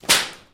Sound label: whip